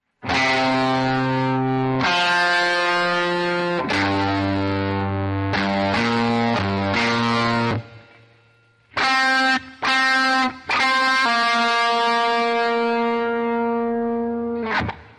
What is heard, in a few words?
Rock guitar; yao gun ji ta